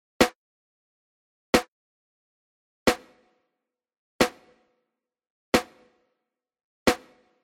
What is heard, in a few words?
Lexicon PCM Native reverb, medium plate